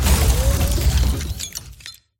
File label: Wulfrum Bastion Break Safe